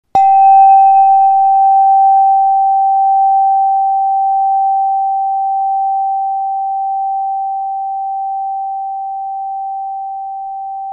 cosmic tube; siderischer tag

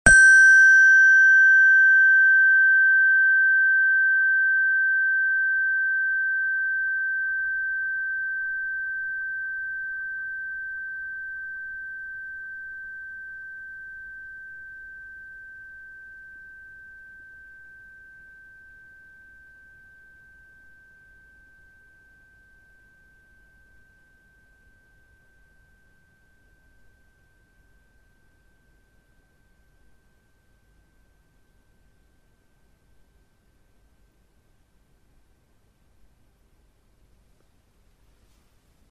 space bar; siderischer tag

